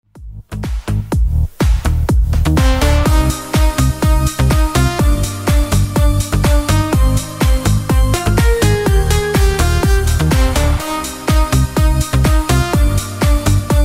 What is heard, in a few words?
Instrumental